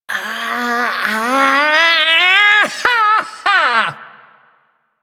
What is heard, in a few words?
Ability Lift Laugh Corpus Lich; Warframe; Persona A